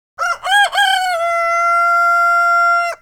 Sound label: Rooster crowing